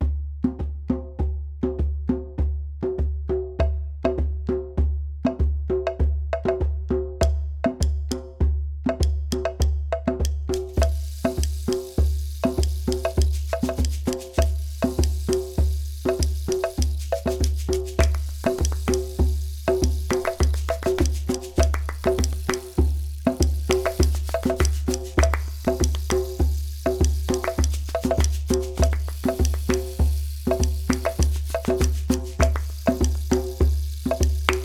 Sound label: Family Percussion Play Along; Rhythms of the Family